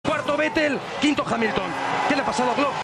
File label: Glock; Glock fault